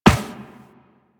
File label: Walk Metal Perforated, Footsteps